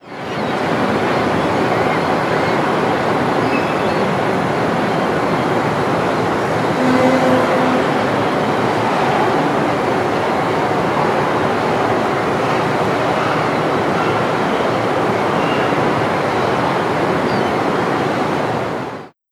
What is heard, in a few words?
Cities paris Harbour